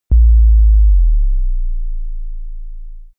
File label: bass drop short